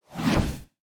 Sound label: Swoosh Transition